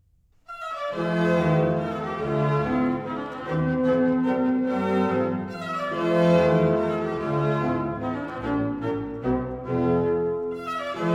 Symphony, Menuetto & trio; Symphony No. 6 in F major, KV 43; Mozart; Symphonies

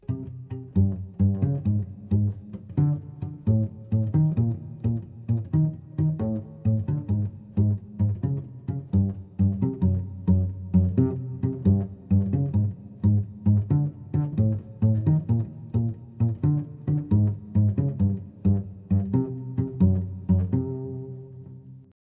Hocketing

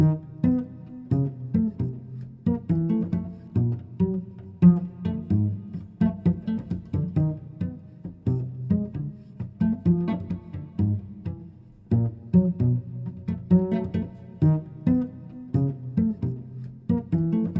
Hocketing

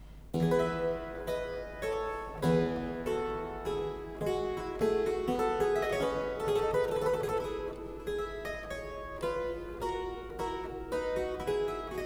Menuet in F major, Keyboard Works; Mozart

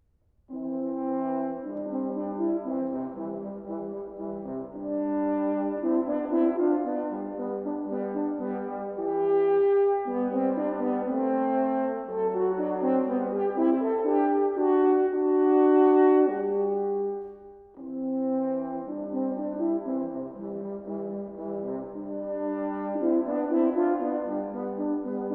Duos for two horns - Andante; Mozart; Serenades-Divertimenti-Dances

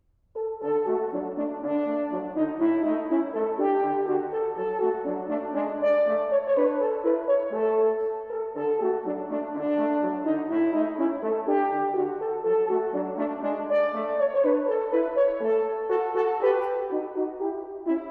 Duos for two horns - Allegro; Mozart; Serenades-Divertimenti-Dances

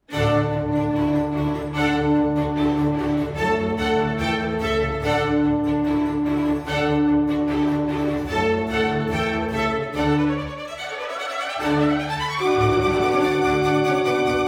molto allegro; Gallimatthias musicum KV 32; Mozart; Serenades-Divertimenti-Dances

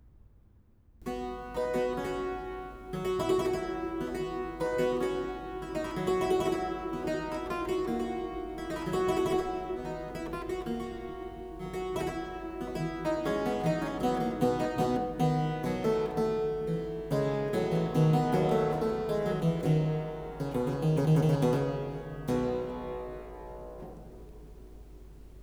Andante in G major, Keyboard Works; Mozart

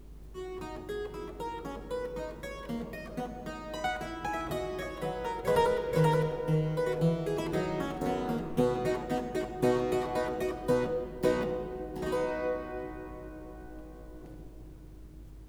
Allegro in C major, Keyboard Works; Mozart

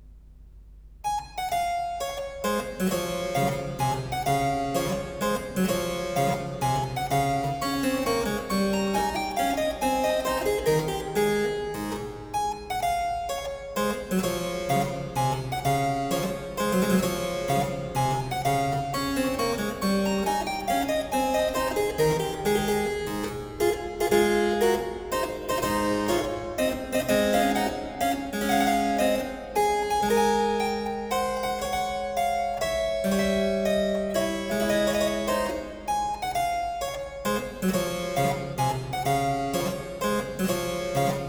Menuet in D major, Keyboard Works; Mozart

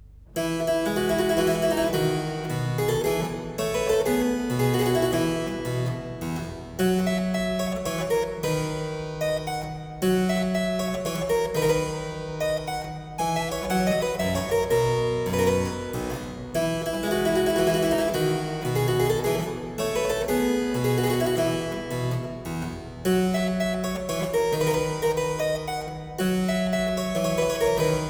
Menuet in F major, Keyboard Works; Mozart

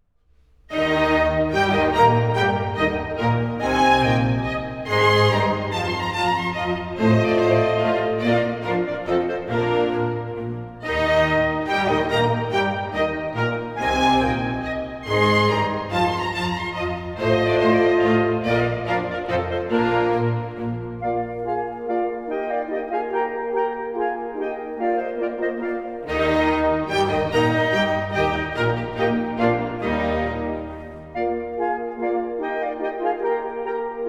Divertimento in E flat major - Menuetto; Mozart; Serenades-Divertimenti-Dances

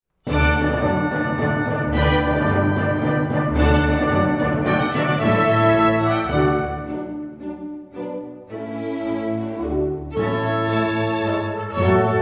Menuets - in D; Mozart; Serenades-Divertimenti-Dances

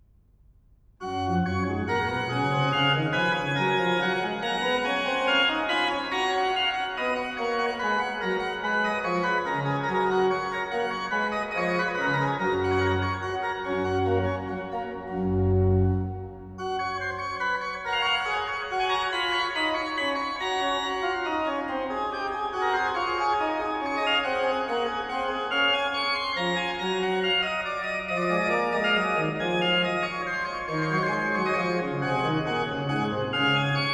Molto allegro in G major, Keyboard Works; Mozart

